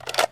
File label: galil clip out, weapons; garrysmod